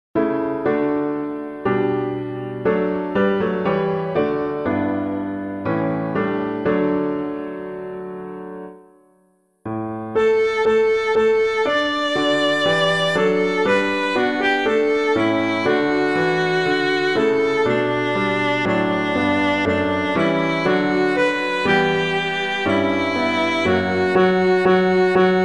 Lord God We Give You Thanks for All Your Saints, piano